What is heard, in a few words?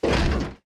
thruster mechanic stop; platform thruster; Factorio